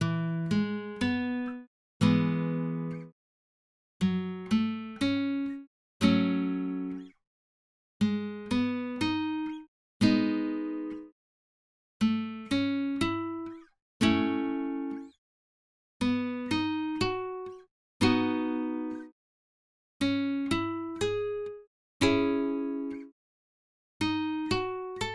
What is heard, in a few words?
The Chords Of E Major